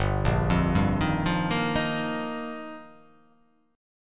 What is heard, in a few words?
G Minor 7th Sharp 5th; Gm7#5; arpeggiated; JGuitar; 3,1,3,0,3,0,0,0